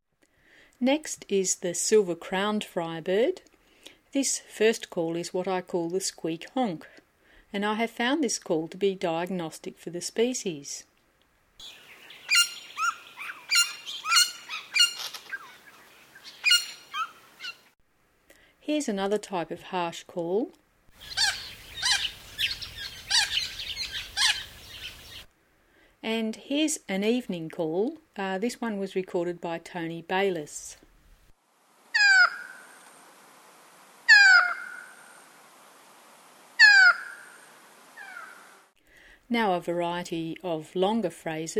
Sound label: BIRD friarbird silver c